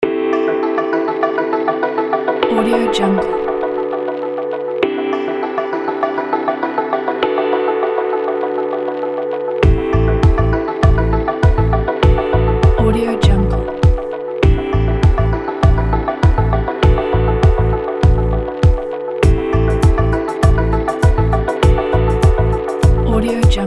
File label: lounging